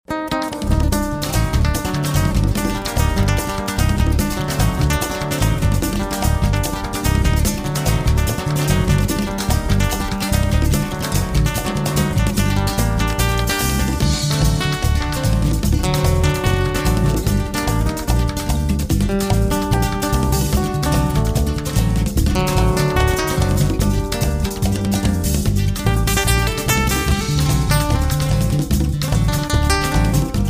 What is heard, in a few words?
Merengue; honduras musical 9no grado